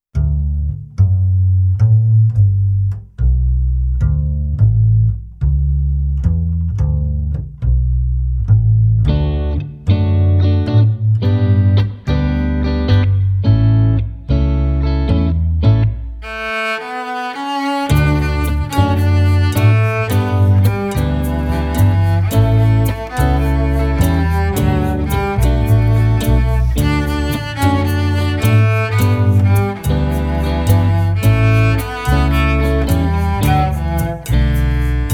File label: Christmas Calypso Duet; Cello Time Christmas